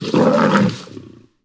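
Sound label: lion attack vox; Civ4 warlords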